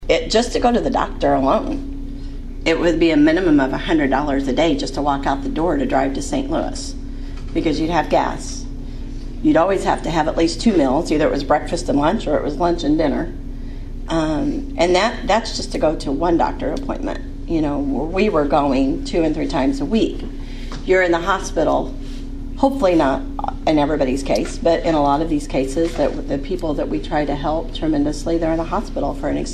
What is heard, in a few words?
Cheer